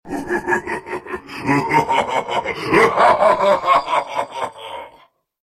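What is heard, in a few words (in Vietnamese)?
tiếng cười người ác kẻ ác giọng đàn ông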